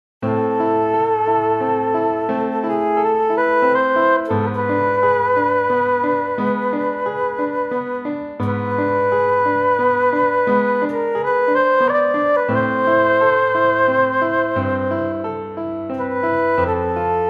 Sonata for Flute

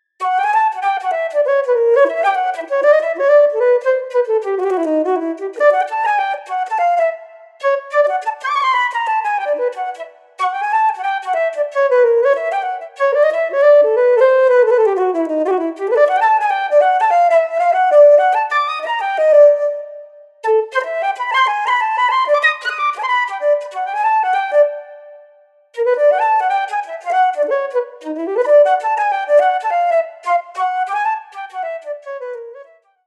FLUTE; 52 melodic etudes for flute book 2

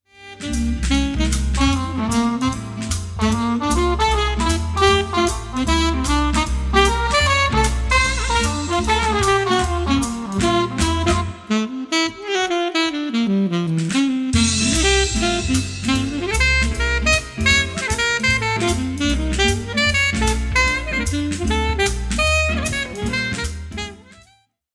Alto

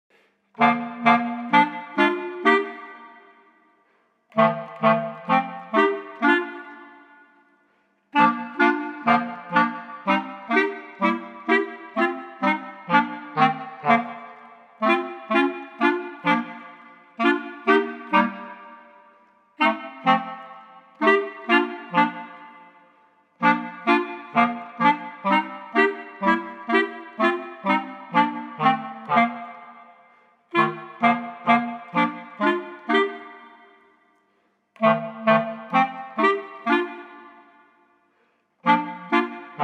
clarinet trio

